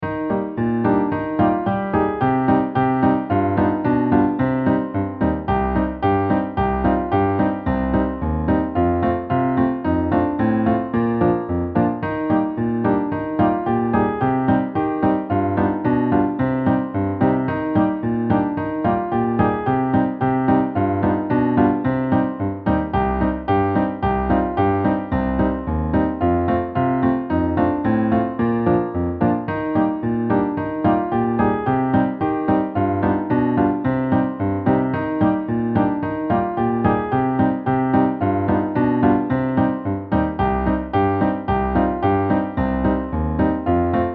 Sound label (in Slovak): klavír